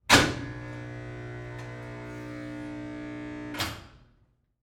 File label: Emergency Security Door Open; emergency effects; AdobeCC